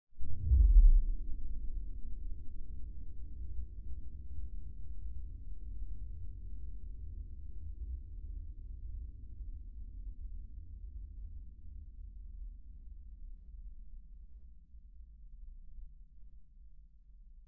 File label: crunch woomph